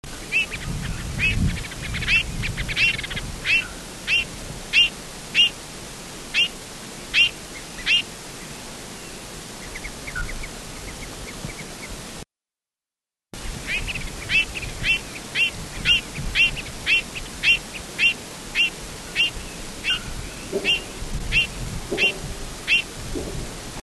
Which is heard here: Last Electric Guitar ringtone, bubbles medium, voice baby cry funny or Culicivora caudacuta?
Culicivora caudacuta